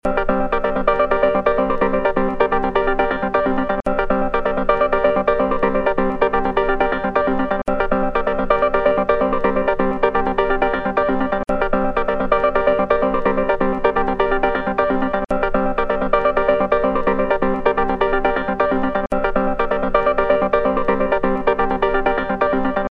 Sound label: arpeggio